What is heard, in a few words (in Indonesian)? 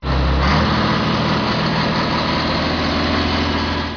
suara mobil